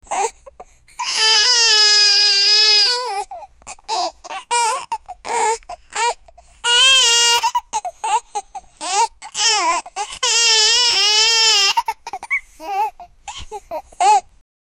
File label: baby crying and laughing; emotion